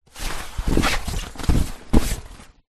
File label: household shopping trolley fabric movement inside